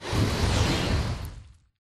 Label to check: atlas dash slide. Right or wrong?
Right